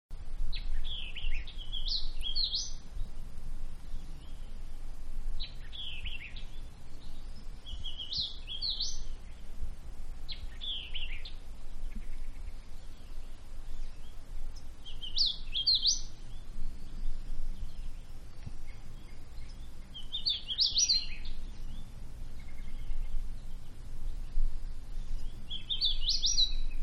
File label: Woods Quiet Bird Song Cricket; Woods-Quiet Bird Song, Crickets, Etc.; Outdoors; Backgrounds; Manhattan Production Music